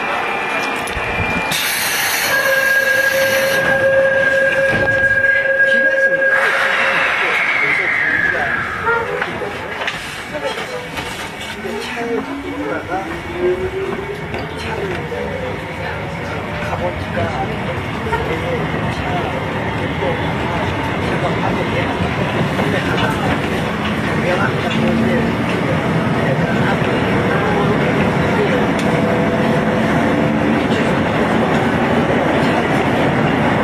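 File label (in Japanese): 走行音, 2号線, ソウル地下鉄; Seoul; 城内(Seongnae)-江辺(Gangbyeon); 蚕室(Jamsil)-城内(Seongnae)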